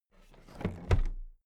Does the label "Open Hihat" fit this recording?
No